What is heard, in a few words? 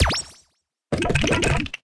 spin Button Click; magic maid cafe